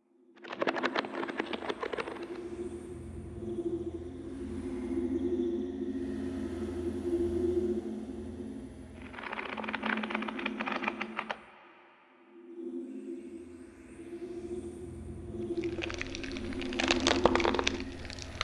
Acid Worm Idle; we are the dwarves gamerip 2016

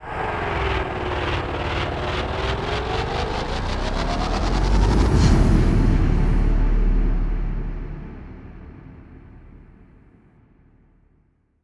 Action Street; The Amazing Spider-Man 2

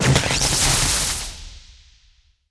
Electric; New The Denpa Men

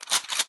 Sci Fi Shotgun Reload

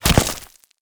Fight Ricochet; Mighty Party; Android

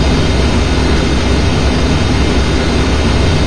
Vehicles; unreal development kit; Raptor

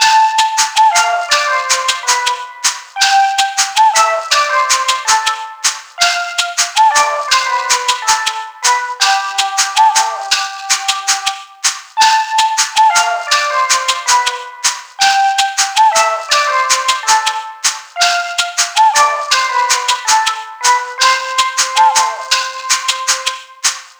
Shop Loop; zombie army 4 dead war ps4 stadia switch windows xbox one gamerip 2020; ZombieHQ

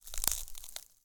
Ice Fields, ice

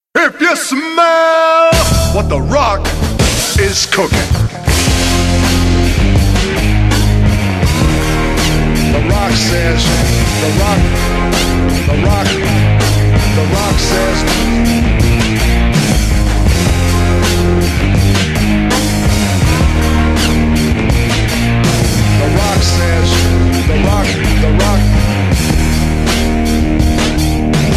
Rock; WWE WrestleMania 21

